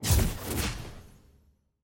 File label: sfx parties member banner intro bronze